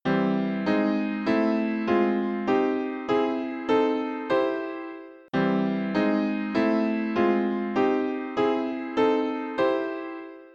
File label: harmony